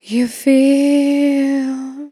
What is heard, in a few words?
You Feel, Vocals Dry